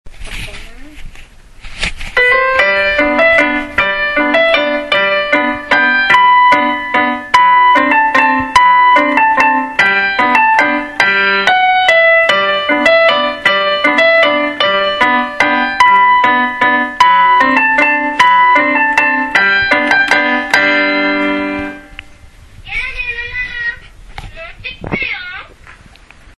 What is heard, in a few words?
waltz